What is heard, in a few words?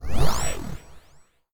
chain groove; Crypt of the NecroDancer